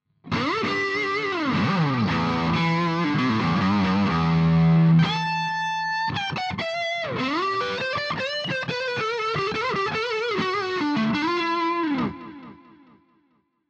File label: Hi Gain Lead